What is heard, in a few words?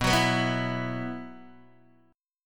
B Suspended 4th, Guitar, Open D